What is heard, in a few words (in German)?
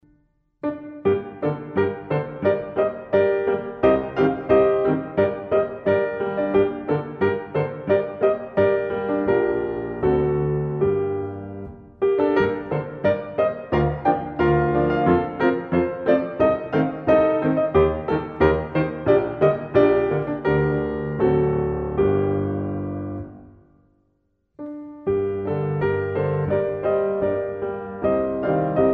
piano solo volk, piano volkslieder; Schifferlied